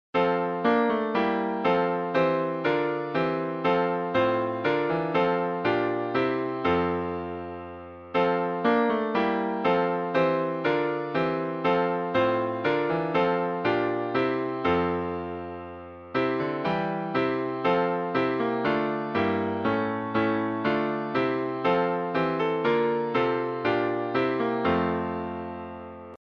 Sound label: Hymns